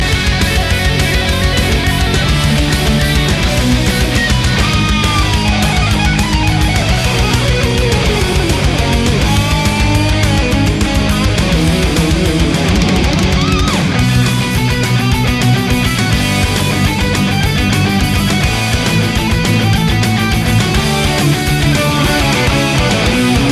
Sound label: guitar solo